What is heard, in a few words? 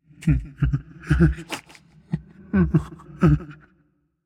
boo hoo; smite; olorun